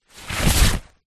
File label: foley rain jacket arm out of sleeve; zapsplat